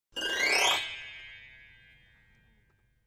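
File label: Grand Piano Strings High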